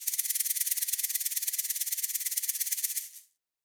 shaker Rolls; Raw Perc One Shots; Slate; SD SEISMIC Drum Sample Pack

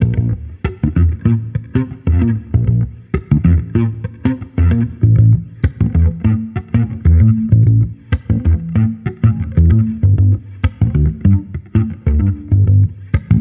Amped loop 96 F, Electric Bass Loops; Slate Digital BASSHOLE Sample Pack

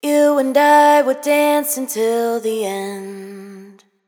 hook 88 Gm wet, Vocals; Slate Digital Omnivox Vocal Sample Pack

